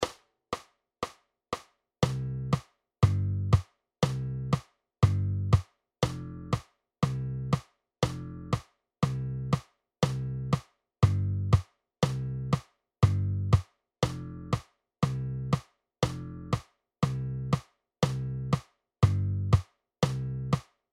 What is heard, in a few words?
Playalong 120 BPM